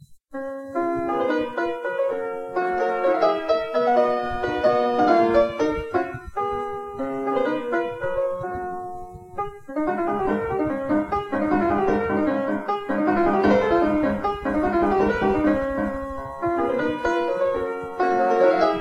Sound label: Mazurka